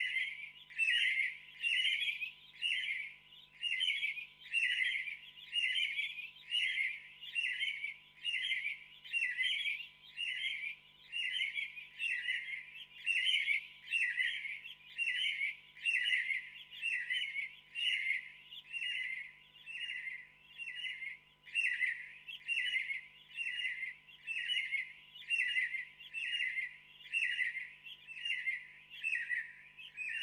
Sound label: birds